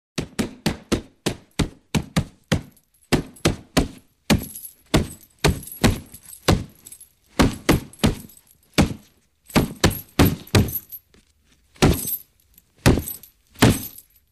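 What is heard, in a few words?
Boxing Heavy Bag Punch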